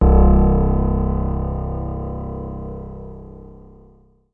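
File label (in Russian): пианино одиночный басс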